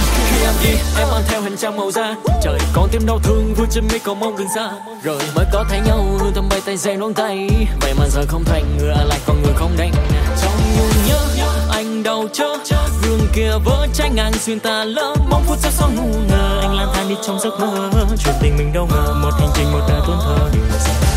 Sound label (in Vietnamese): Nhac Chuong Rap; nhac tre